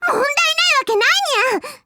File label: shouting; faris